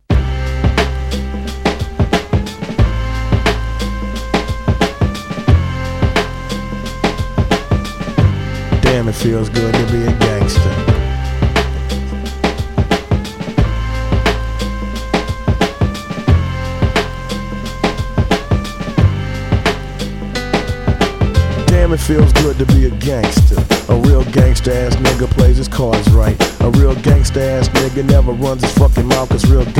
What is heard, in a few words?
Ringtone